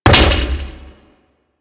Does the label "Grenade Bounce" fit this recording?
Yes